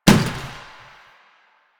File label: Janitor Cart Impact Short; Warframe; Ambience; Nineteen Ninety Nine